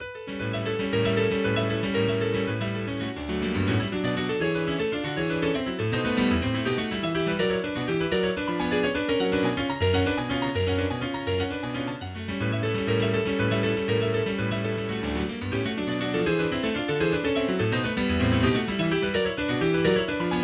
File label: Prelude D Major